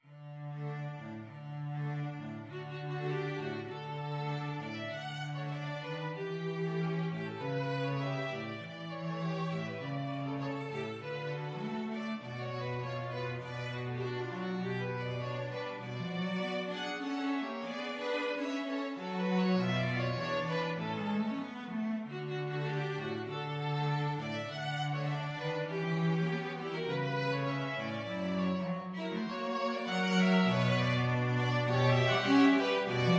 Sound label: tango, string trio